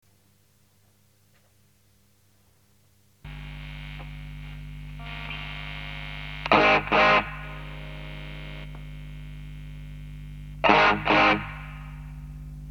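BOSS NS-2 Noise Surppressor, NOISE REDUCTION (OFF→ON); THRESHOLD 6 DECAY 6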